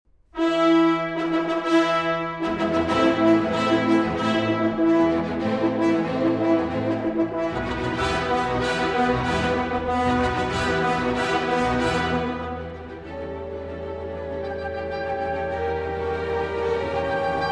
Piano Concerto in G Minor, Presto – Molto Allegro e Vivac; Mendelssohn; Piano Concerto No. 1; Op. 25 III.